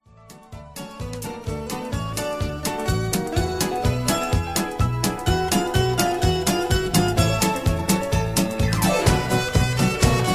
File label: music with harmony